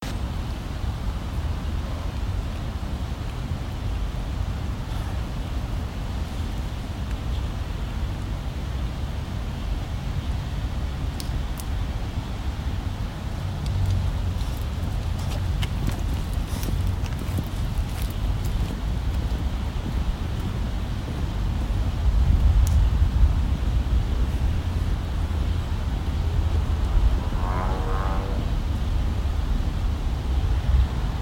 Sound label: Tokyo